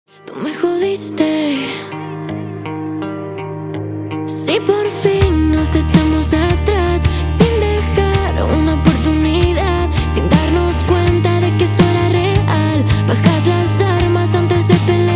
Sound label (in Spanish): Pop Rock